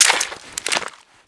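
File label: weapon foley ump45 reload clip out; Call of Duty Modern Warfare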